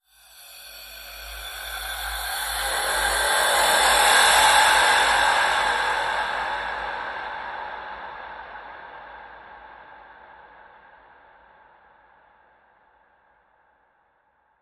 Inquisitive Breath Transition

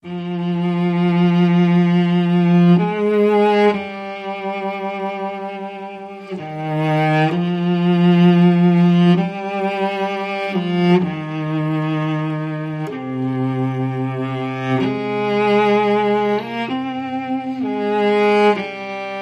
Gentle Flow Cello Intimate

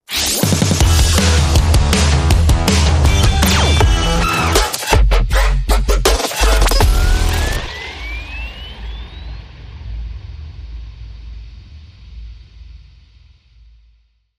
Countdown

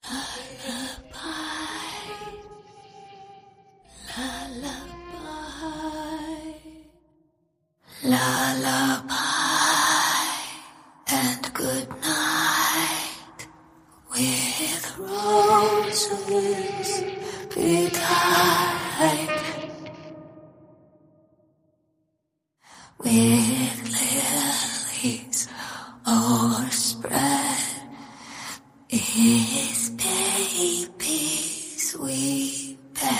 Lullaby Horror, Vocals Only

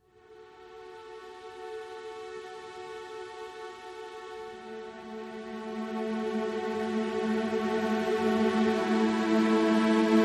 Sculpted Space Underscore

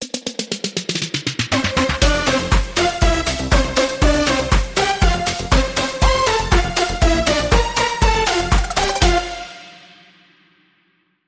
Techno Happy Birthday

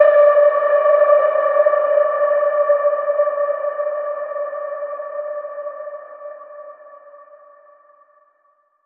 Ping Fearful

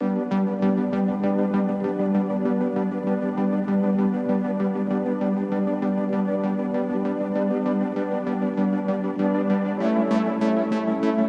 Pad

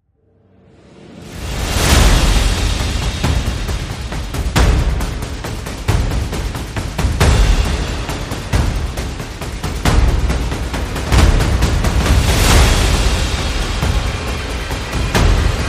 Percussion and Effects

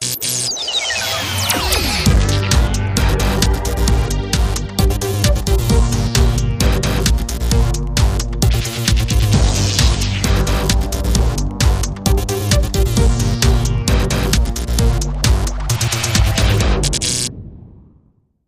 Drum Ping Crackle

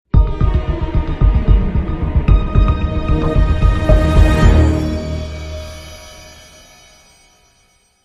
Crime Sting, Short

